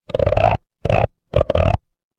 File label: animal frog